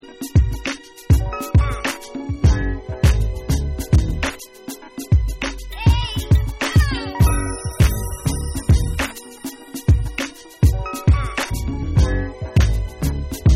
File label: Remix Instrumental